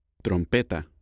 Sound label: musical instruments